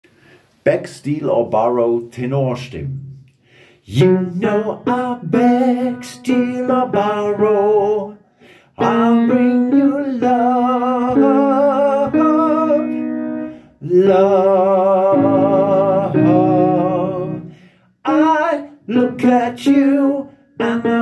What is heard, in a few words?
Tenor